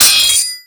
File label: SW HIT, sword hits; Total Control - Action Cutz